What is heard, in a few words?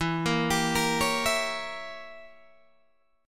E Diminished 7th E, Guitar Open G